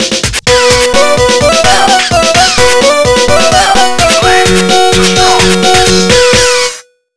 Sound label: Groove Rush Game Over; Sonic Rush OST